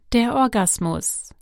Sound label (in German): orgasmus